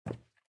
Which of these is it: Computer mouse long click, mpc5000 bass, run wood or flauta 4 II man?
run wood